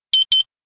Alarm

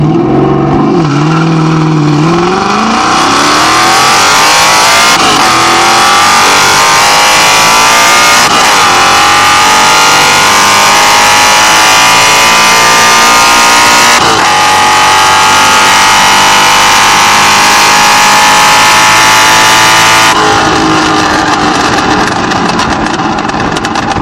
C4 zr1 takeoff

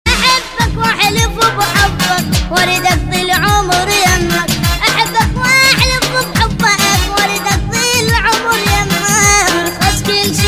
Arabic Tone

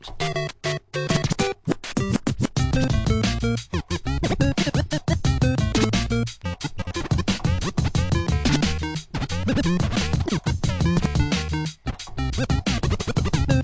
Hip Hop; Mario

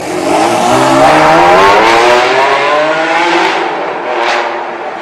Ferrari Enzo Sound

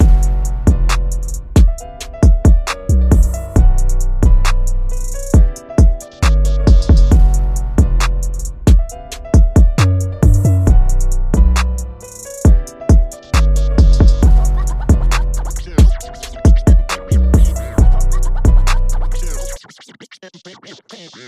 theme Trap

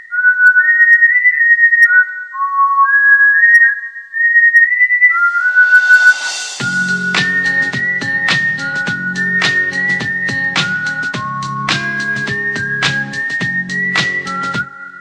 Flute

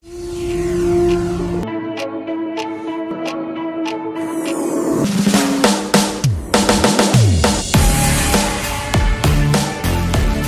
INST

